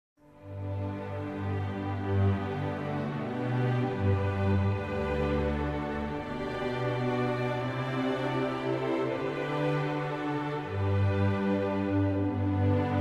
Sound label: Symphony Orchestra, Hark! The Herald Angels sing